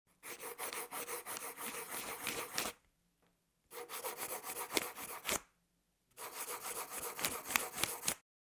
zvuk karandasha

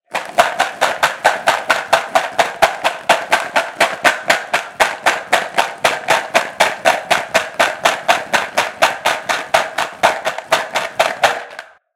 zvuk trjaski

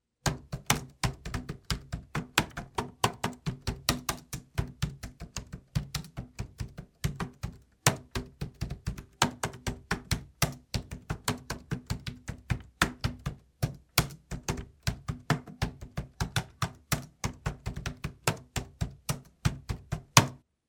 zvuk klaviatury

